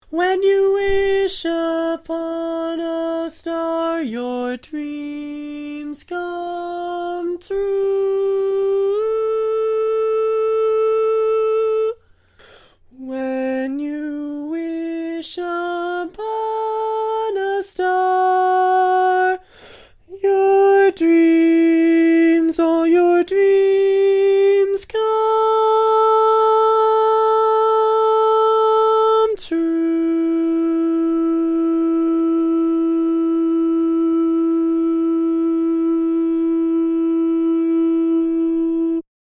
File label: Tenor, barbershop